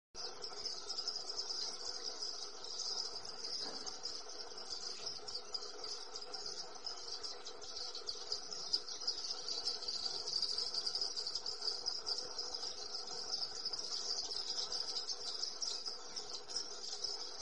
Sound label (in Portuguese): canto do pardal dourado